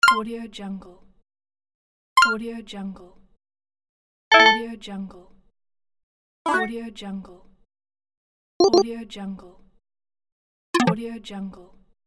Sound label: short messenger blip; warpjumper